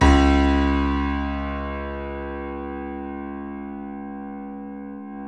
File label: DB1, YC7 Piano mf